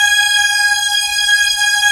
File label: synth disco, dance synth; Sound & Vision - Gigapack I CD 2 (Roland)